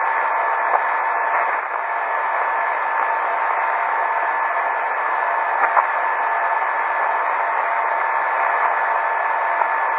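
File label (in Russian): долгий звук радио помех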